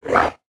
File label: monster; khanat; animals; monsters